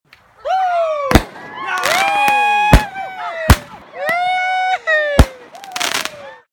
fireworks

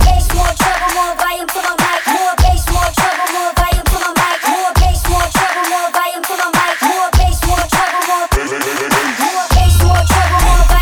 Bass Work

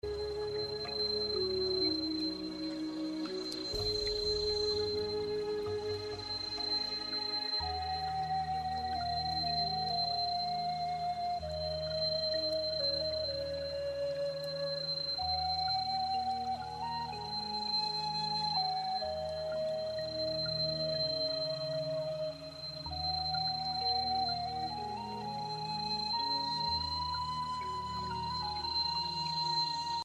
Flute Fantasy 8192Hz

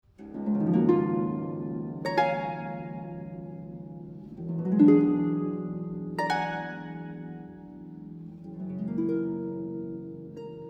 Solo Harp

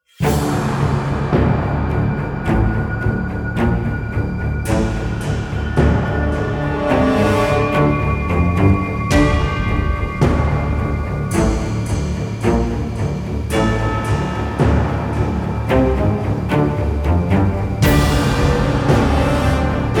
Evil Unleashed (Orchestra Only)

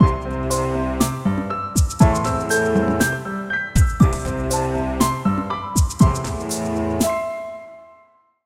Modern Piano Groove Audio Logo

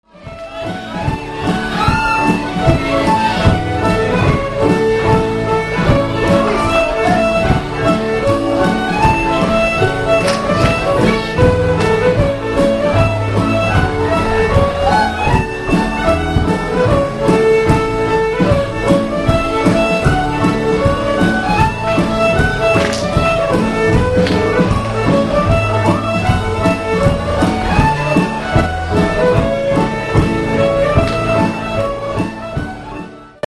Canadian Barn Dance

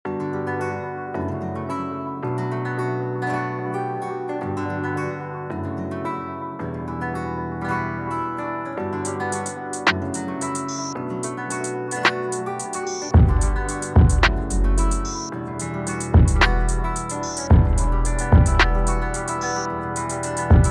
beat; ableton

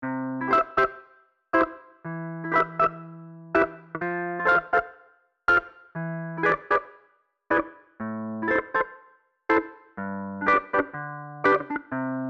reggae bpm60; archibald editions

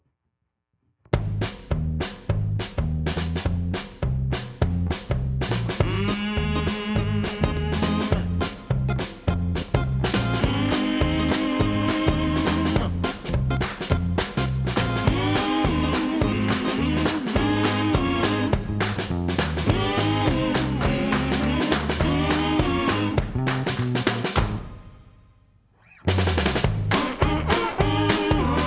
Upbeat; Toyota

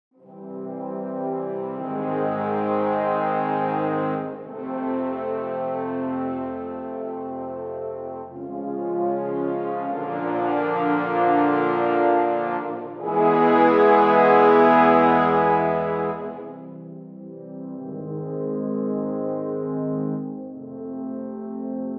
Brass Longs

